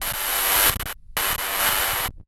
glitch; udio geophonic design vol 2; fvtt sonniss gdc audio

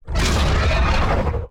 dry pterodactyl roar; sonniss gdc

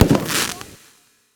glitch factory boom crackle; sonniss gdc

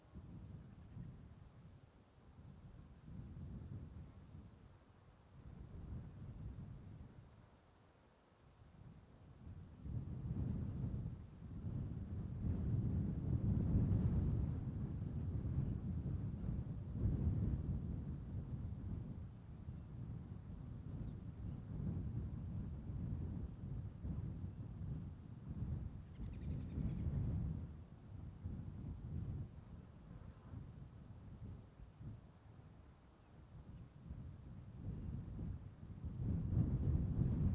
wind gusty buffeted mountainside birds; sonniss gdc

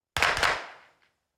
hk mp5 9mm 75m behind clean double shots; schoeps; Sonniss GDC

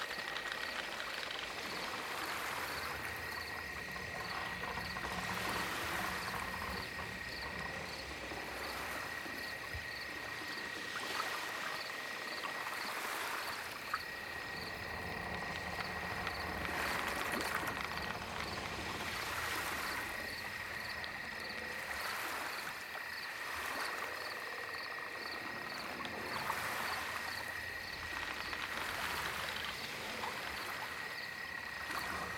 lakeside dock night, ambiences; Michael Ghelfi

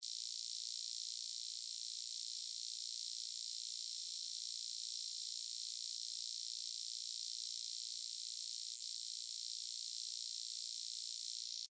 electric hum buzz; sonniss gdc

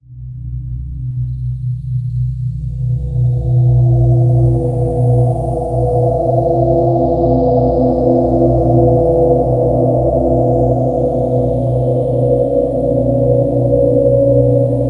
synth texture melodic phrase soft; bluezone; sonniss gdc

